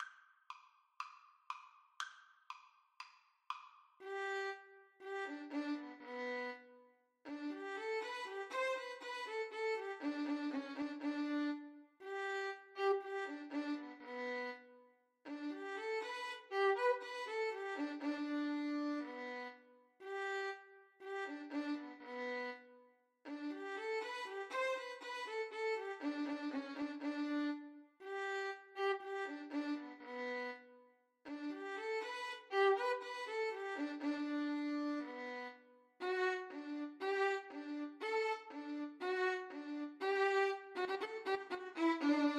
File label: violin duet